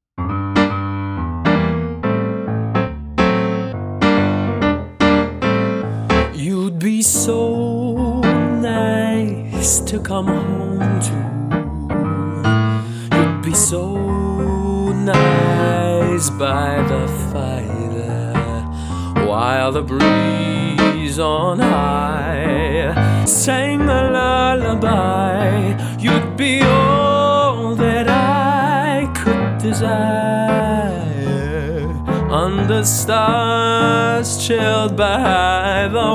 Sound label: chant eng